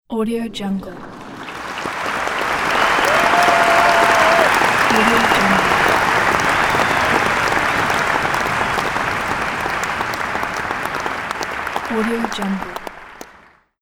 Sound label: applause